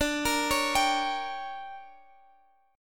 D Major 7th Suspended 4th Sharp 5th, Guitar, Standard; DM7sus4#5; arpeggiated; x,x,12,15,14,15; down; capo=3